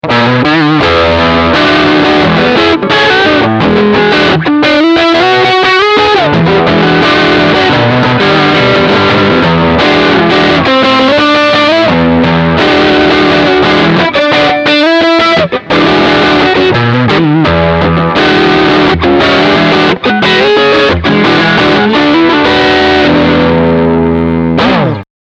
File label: Riff Overdrive Fronthumbuck